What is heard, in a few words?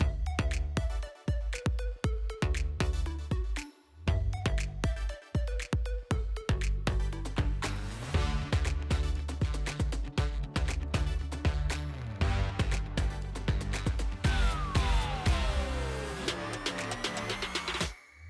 Music loop; My Little Pony World; android